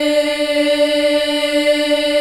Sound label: D3 child eh, Choir; USB Soundscan vol.28